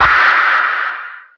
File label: "ah" exhale